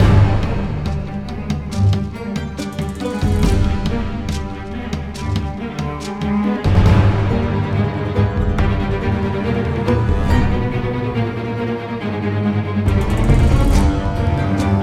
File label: String; Assassin's Creed Unity Dead Kings